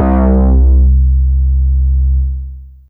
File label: Juno Bass C2, Fairlight CMI IIe